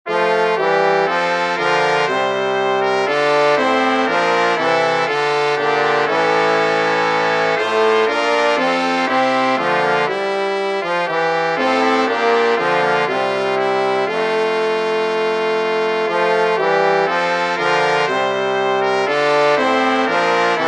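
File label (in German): Instrumentalstück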